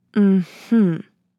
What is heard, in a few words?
Young woman moan with satisfaction